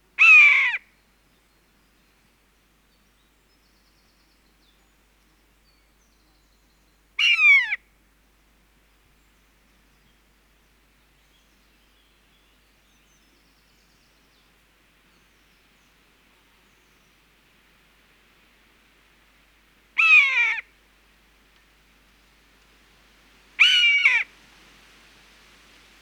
Maeusebussard Ruf Hunger

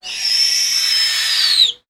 Schneeule Ruf

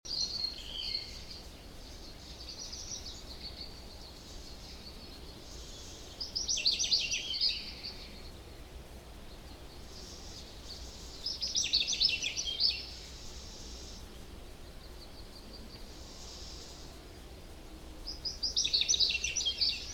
Stieglitz Gesang, Voegel in Europa

